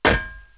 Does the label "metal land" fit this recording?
Yes